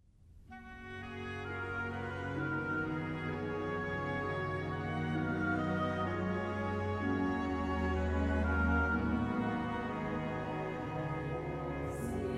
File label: Choir